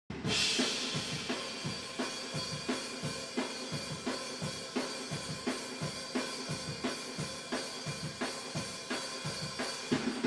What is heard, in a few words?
drums mix fx return eq